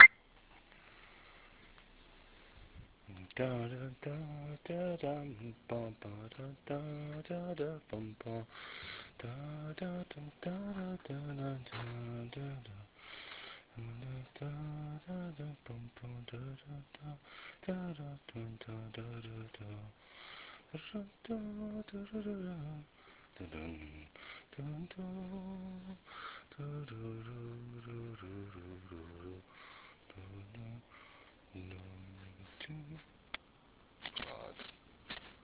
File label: Voice Mid tempo Bass heavy